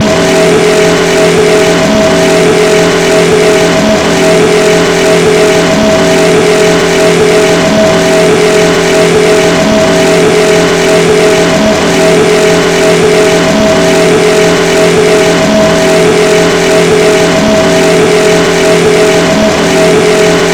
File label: ex CMAXA, F1; Italy; AOS F1 2022